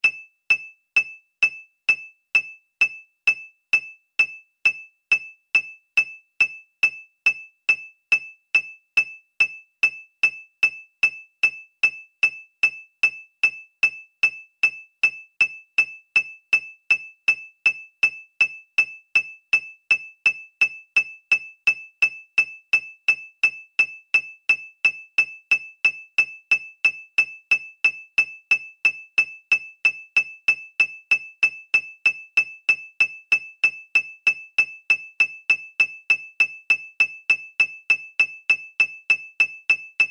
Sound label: metronome